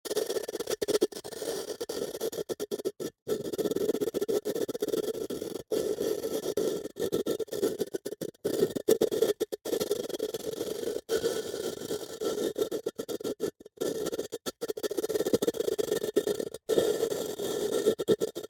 Jar, terracotta, ceramic, lid, rubbing, scraping, grating, rough, ragged, stutter, forceful; Eiravaein Works; Vaeyan IV